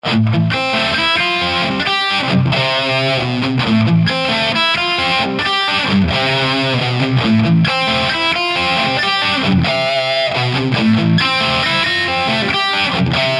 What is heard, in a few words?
thunderchief gain100 (humbucker); olcircuits